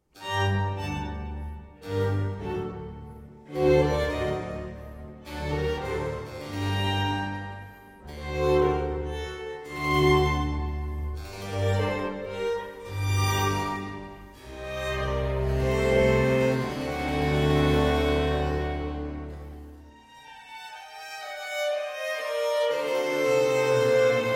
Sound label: Violin Concerto in G Minor, Allegro Non Moto; RV 315, L'Estate